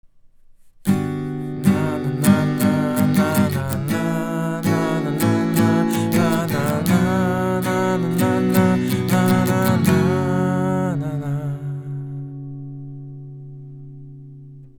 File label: guitar and voice; lewitt lct 640 ts; xlr; конденсаторный, широкомембранный, микрофон; Lewitt LCT 640 TS — это конденсаторный, широкомембранный, микрофон за 1000$